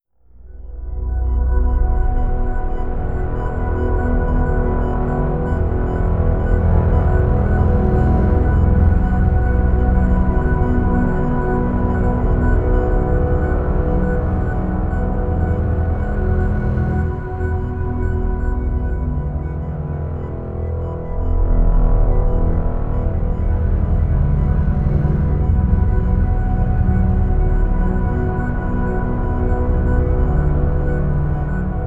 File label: Ambient; FMOD Example